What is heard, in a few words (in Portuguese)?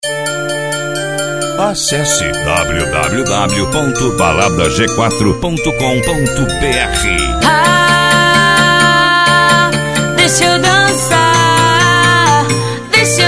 batida funk